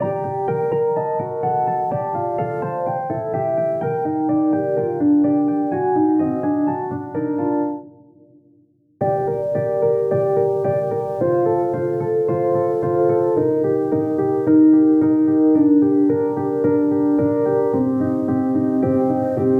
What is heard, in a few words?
Classical